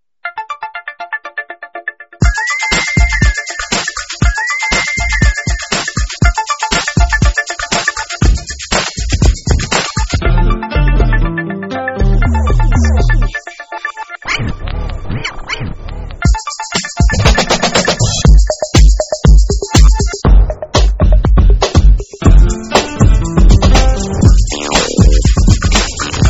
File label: Underground Hip Hop